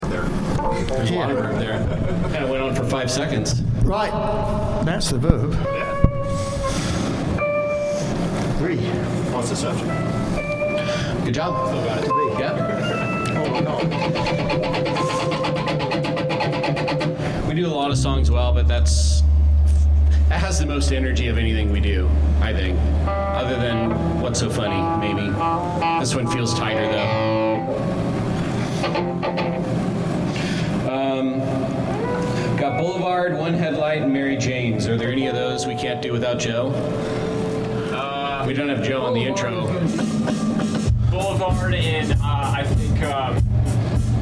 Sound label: Background Chatter